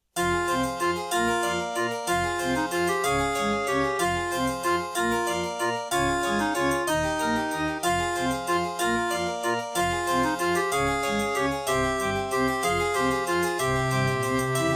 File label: Serdaco Dreamblaster X2GS_NATIVE; Death Gate